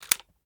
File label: button; tacz; daffas arsenal